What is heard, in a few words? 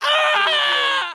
Scream Male; sound effects bible